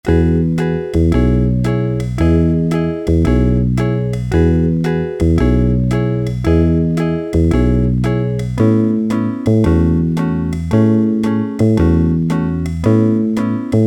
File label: SWING GUITAR, Yamaha SHS-10 Factory style demos